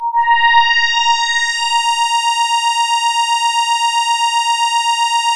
A#, Synclav FM; Big Fish Audio; SPACE1

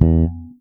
FRETMUTE E, PEDULLA BASS; Masterbits CLIMAX 7 - Bass

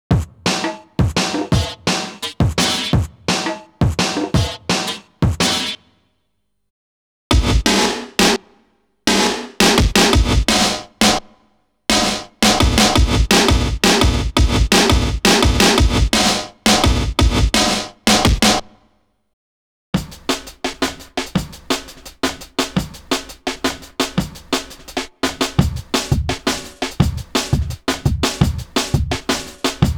breaks, Jungle; Zero-G Jungle Frenzy 2

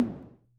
Tom, rhythm 55

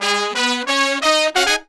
Phantom Horns, 132BPM; Zero-G

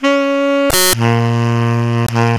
SAX Tenor Breathy C#4

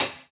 Closed Hat, JUNGLE BREAKS; ORIGINAL JUNGLE BREAKS

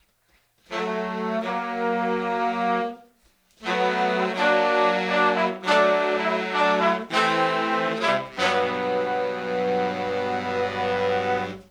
Soul Horns, 70s Analog Funk

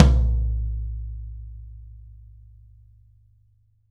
YAM18, drums; Big Fish Audio; Ross Garfield Drums VOL-2

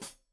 Hat Foot, Hi-Hat, Cymbals Stereo

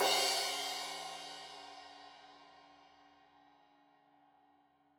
Crash 15in L edge, Cymbals Stereo

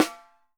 4X10 SOPRANO; Sampleheads; Peter Erskine Living Drums

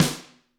GRETSCH SNR, Double Platinum Drums; ILIO; Double Platinum Drums 1

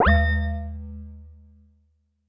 U220 FX Set, Drum Machines; Roland U220

